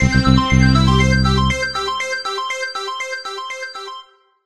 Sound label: Sleep